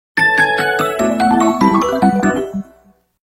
Home Menu Jingle; Style Savvy Fashion Forward; Nintendo 3DS

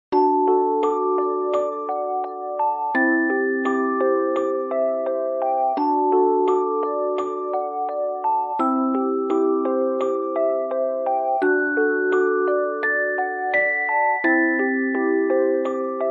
music box; Cinderella Phenomenon soundtrack

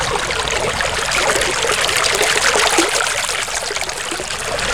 Ruins Stream Loop

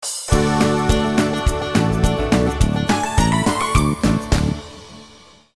Level Complete; Farm Frenzy 2

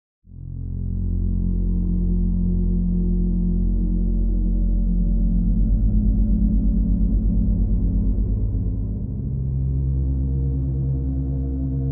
Bass Ambience

